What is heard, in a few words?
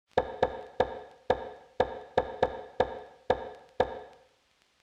Percussion pattern 1 3 2 rhythm